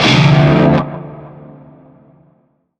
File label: Shawzin Min Chord; Music; Warframe; Narmer